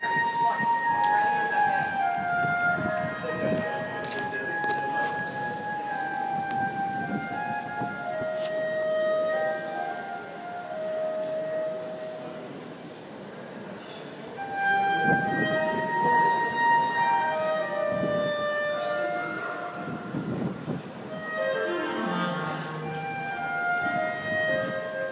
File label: Clarinet; Listen to a street musician playing the clarinet outside the Louvre; Paris